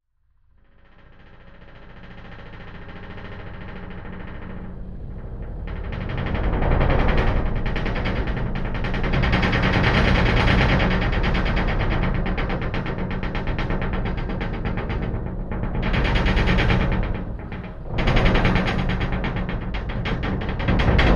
Metal Hvy Vibrate, metal groan atmos

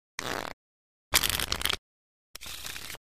Cards Shuffle Deck; casino games

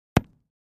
Ground Hit Sledge Ham; rocks & dirt

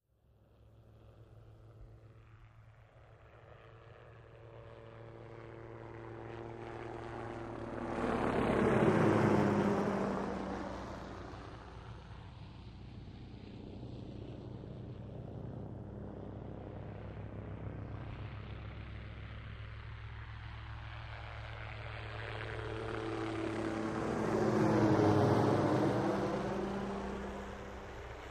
OH6 Loach Circle Land, little bird

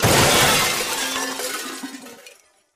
Metal Glass, mixed

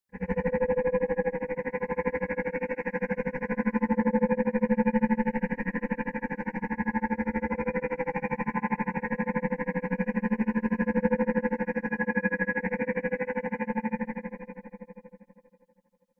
Alarm Collision War

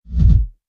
Bass Impact Double

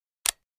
Button Solid Compute

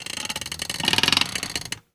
Chair Creak Lean Bac, squeaks & creeks

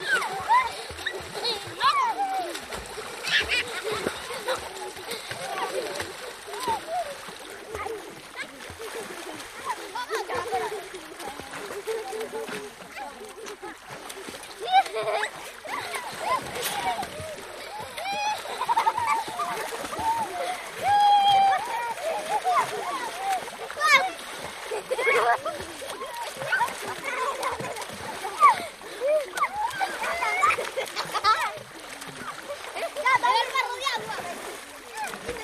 Kids Venezuelan Play, kids play

